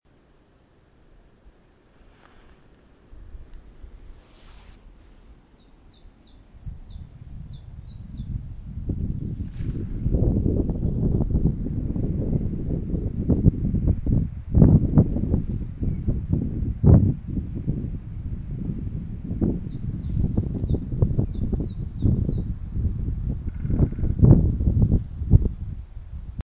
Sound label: birdsong and woodpecker cloughton